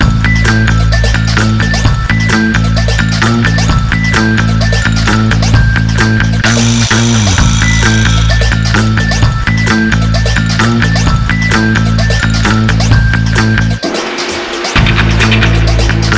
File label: Fear Tension Mid; bully scholarship edition